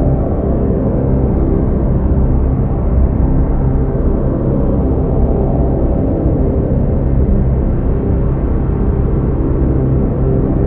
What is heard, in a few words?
Dispenser Idle Loop